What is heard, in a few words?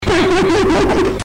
laugh; cstrike